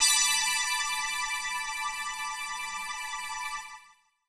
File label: 125 pad E, Keys, Nu Jazz House; PowerFX - Nu Jazz House